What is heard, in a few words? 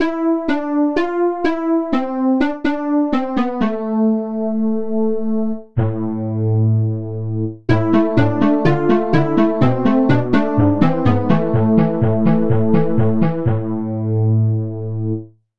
chiffer lead